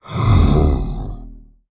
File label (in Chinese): 劫掠兽待机声